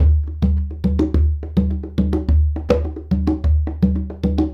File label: Congas; Essential Percussion